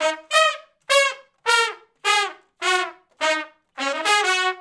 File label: R B Riff (Eb) unison, Horncraft; Sections